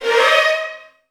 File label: VN FX, Virtuoso Strings